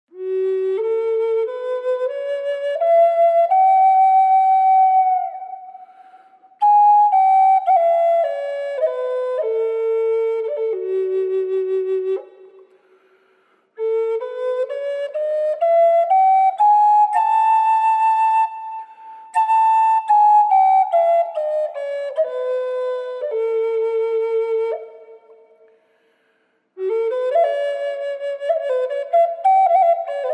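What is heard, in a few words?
birds eye redwood fsharp minor reverb, flutes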